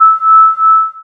weapon active; moondef

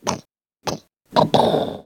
break-wall; abyss

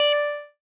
plink; jumping santa

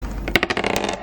Dice; Yahtzee

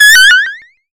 shield alarm; moondef

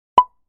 Plop; PaintMe

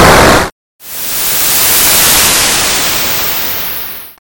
nuke; moondef

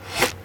dart; abyss

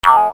blips; Pong 2014

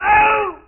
Aoooh; SpacePanic

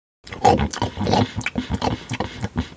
chew; gemsters

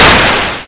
boom base; moondef

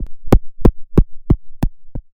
down stairs; abyss

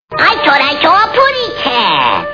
Tweety; ReAct

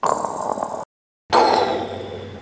push-open; abyss

